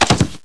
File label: bodyfall